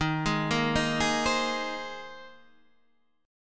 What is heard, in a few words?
C Minor 7th Sharp 5th, D sharp, chord